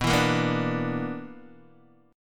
B-Minor Major 7th Double Flat 5th B down Guitar DADGAD